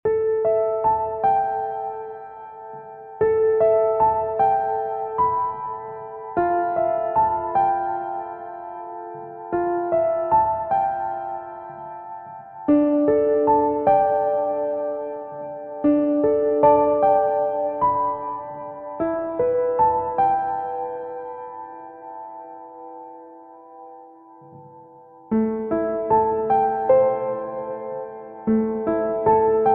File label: acoustic piano; music on hold samples